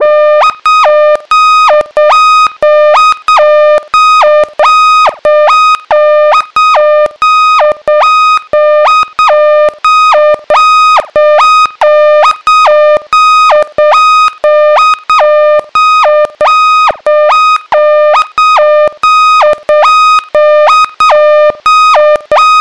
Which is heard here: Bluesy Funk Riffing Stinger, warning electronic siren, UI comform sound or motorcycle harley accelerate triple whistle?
warning electronic siren